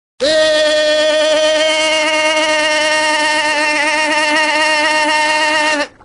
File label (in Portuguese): Risada; Risada Do Bob Esponja (SpongeBob SquarePants).